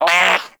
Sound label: Armadillo Vocal Pain; Warframe; Characters; Hunting Critters